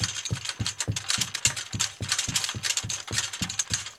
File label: Roll Library Cart Wheel Loop; SFX Kit; Foley - Misc